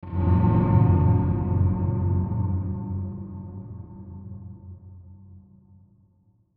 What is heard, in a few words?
Sweeper Swell Dark Low; Blastwave; Production Element Imaging Element Accent Transition